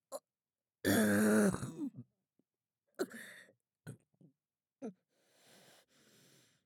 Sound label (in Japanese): ザーメン ゲップ（小）; ザーメンゲップ集